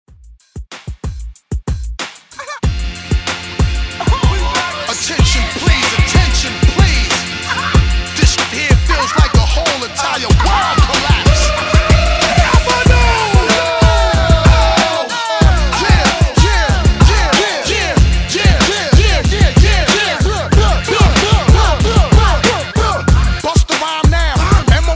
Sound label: ReDrum 94 Bpm; DJDOLLS